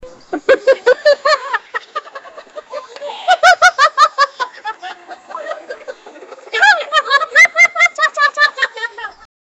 a group of females, field; man

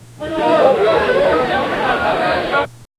distant 19th century crow

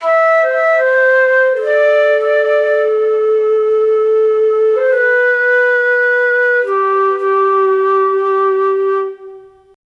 sad deep flute c key, melancholy

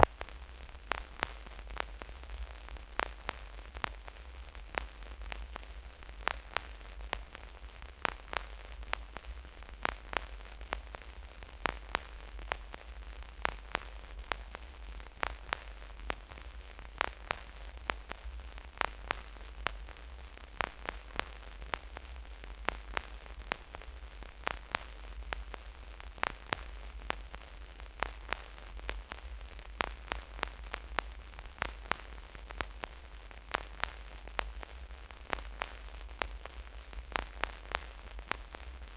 vintage vinyl record crac; nostalgia

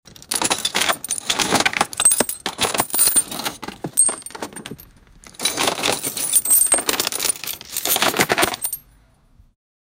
open a wooden pirate treasure

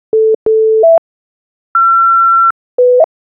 progressbar sound

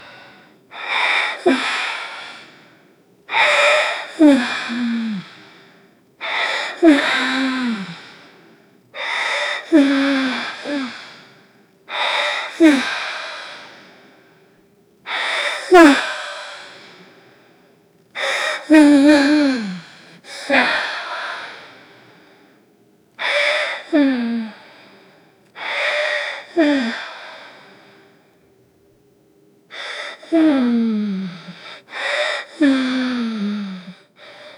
years old man holding, close mouth

